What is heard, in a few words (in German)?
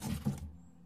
briefkasten einwurf; minecraft